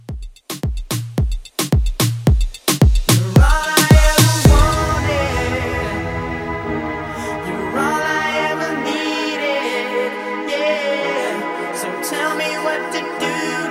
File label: 90s Pop Moombah Mixshow Mix 112